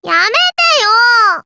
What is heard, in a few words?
stop